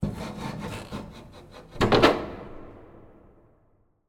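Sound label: Door Hardware Big Metal Gate Pushbar Squeak; Stereo; Justsoundeffects; Metal Movements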